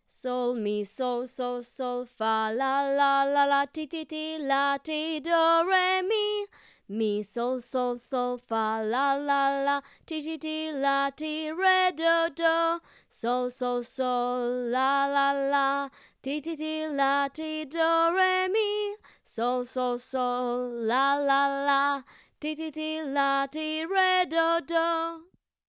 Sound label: solfa melody